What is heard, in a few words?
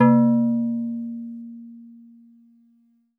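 Steel Drums DX100 A#0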